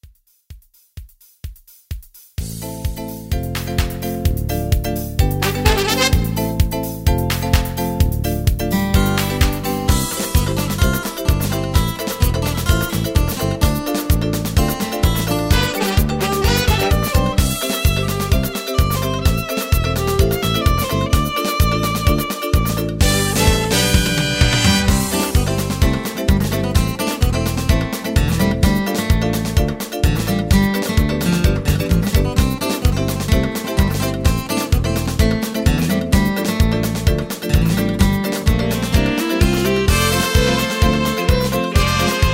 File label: Samba Hoedown patter